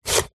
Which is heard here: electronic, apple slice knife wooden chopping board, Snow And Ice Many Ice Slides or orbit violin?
apple slice knife wooden chopping board